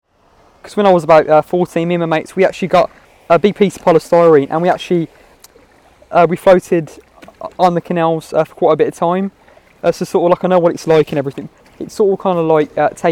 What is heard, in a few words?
polysterene; kayaklibre; 2011 Birmingham